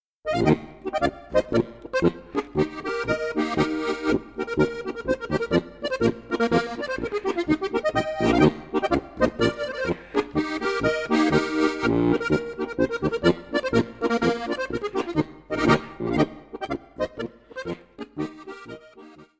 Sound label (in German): Mooshausl Polka, Volksmusik; Volksmusik allgemein